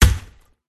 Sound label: shaman attack contact; zarlog